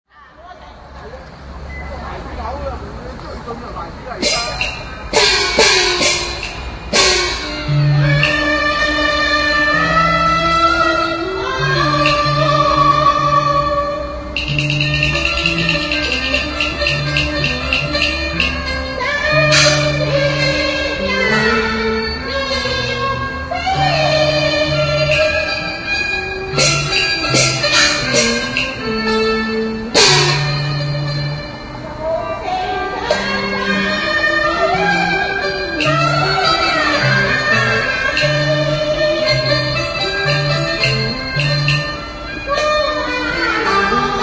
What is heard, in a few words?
Pekin Oper Guangzhou; china; Ausschnitt; terratravel